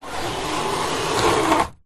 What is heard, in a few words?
household blind venetian cord pull close up